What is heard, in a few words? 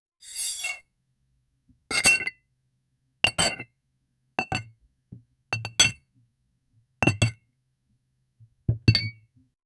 ceramic clink as plate; ceramic clink as plate to; sprinkle